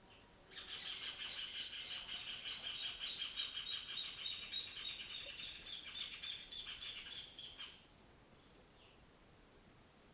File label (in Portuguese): Ambiencia rural dentro de casa a tarde com passaros e cortador de grama; longe